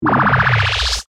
Laser Ascend; Ascends; Sound Design; BlastWave

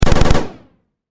Science Fiction Pulse Rifle Short Burst; Battle; SFX Bible

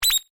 UI Back, User interface feedback sounds; Ui Set F; Computers; Electronics

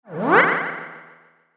Whoosh Blurp Delay; Blastwave

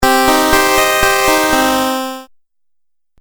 Game Music Phrase, Video Game Sound Effects

